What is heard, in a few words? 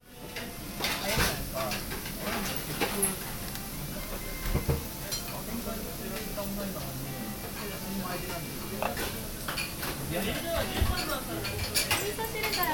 noodle shop, tokyo